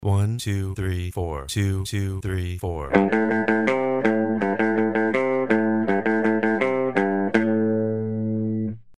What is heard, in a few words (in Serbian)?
Heavy Metal Lick FAST, Gitara